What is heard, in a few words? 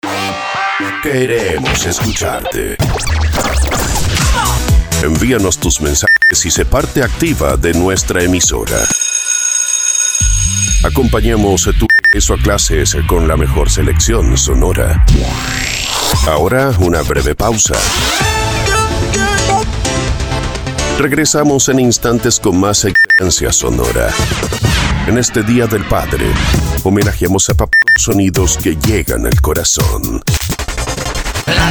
Pack Ambient; New anual